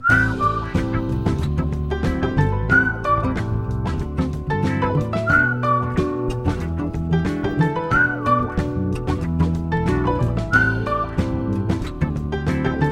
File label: romantic love background whistle tone ringtone